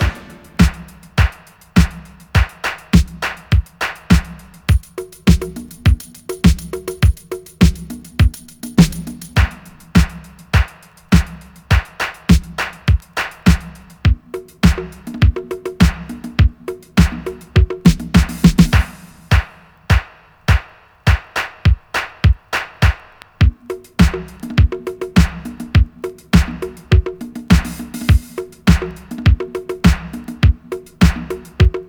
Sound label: Grand Drums